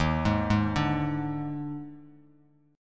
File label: Eb Major 7th Suspended 4th Eb, Bass Standard; arpeggiated; 11,11,6,7; EbM7sus4